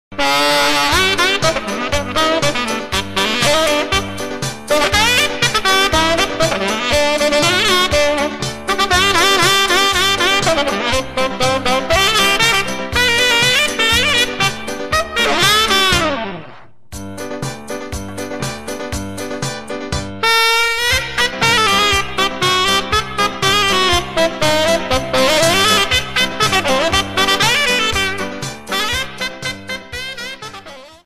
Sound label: COUNTRY